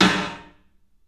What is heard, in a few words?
PA DEEP R, CLUB SN VRB; 3D Sound; 3D Drums